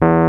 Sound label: Rhodes; PlatinumPhattCD1; E-MU Producer Series Vol. 8 – Platinum Phatt; Best of Phatt